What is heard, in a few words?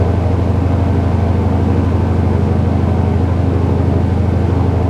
an2 cockpit r, engines; War Thunder